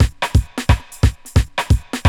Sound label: 88 STEPP, loops; AMG; Now CD-ROM (Roland)